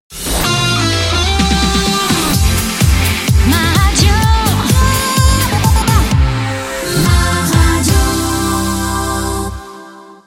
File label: ROCK